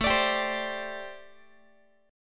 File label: A Minor 11th, Guitar; x,17,17,17,16,x; strummed; Am11